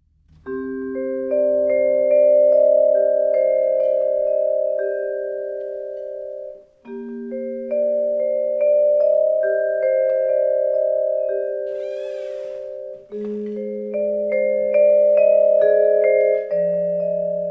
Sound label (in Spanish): percusion